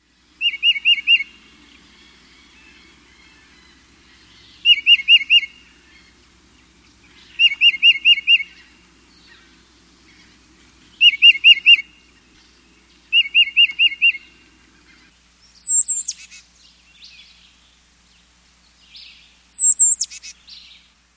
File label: tufted titmouse